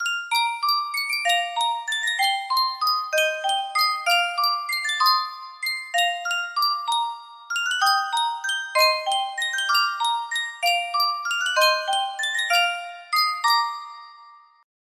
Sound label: Sankyo music box